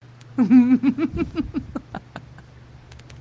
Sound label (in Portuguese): risada malefica; aisaka